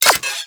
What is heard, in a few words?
Sniper Rifle Mech; Warframe; Weapons; Corpus